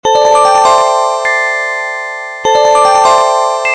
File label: Sms Reminder